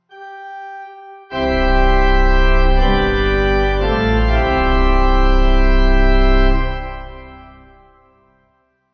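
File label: Morning Service Pipe organ, Lutheran; Lutheran - 1941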